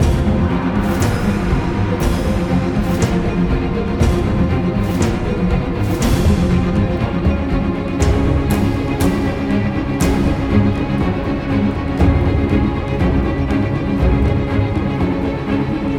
String; assassin s creed unity dead kings

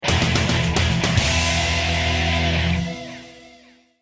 STINGER Level Up; Gun Bros 2

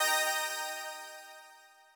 Rush Clear Ebaug

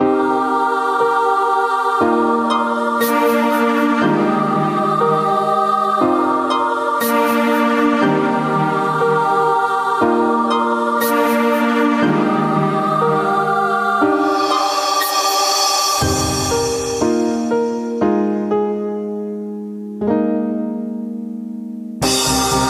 BGM; Adventure Player; psp

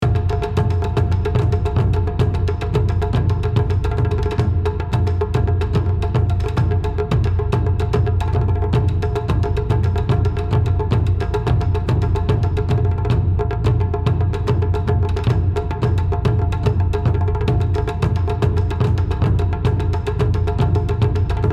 Battle Drums (Loop); B Path of the Teal Lotus

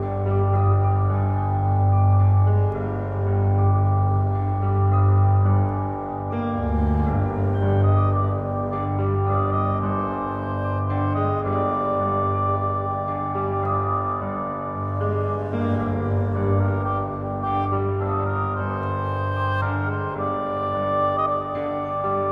Dreamlike loop

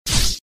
Sfx Knight Attack Hit; Mighty Knight 2

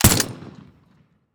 silenced; teardown; ps5 windows xbox series xs

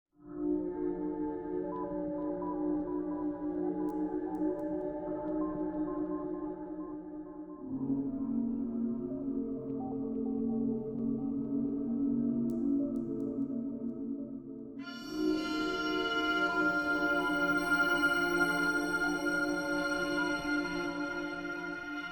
End Music

